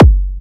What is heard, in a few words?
box cutter, Kicks Electro